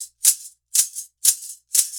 Shaker; Continental Drift Aftershocks

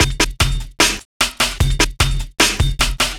Beat, Drum N Bass

